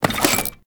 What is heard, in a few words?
Sticky bomb Reload; Grineer; Veilbreaker